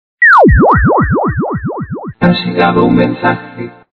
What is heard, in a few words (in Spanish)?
mensaje ringtone